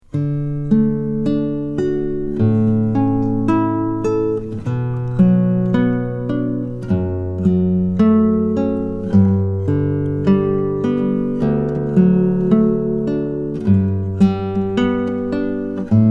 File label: canon, guitarist